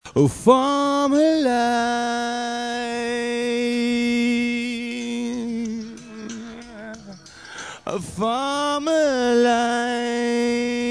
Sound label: melody shaping